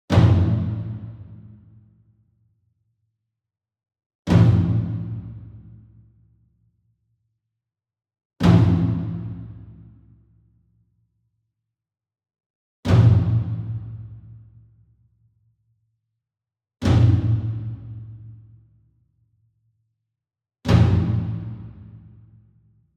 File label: Single Drum Taiko 28 Inch Flam Hard Beater Far; boom library; Cinematic Strikes Construction Kit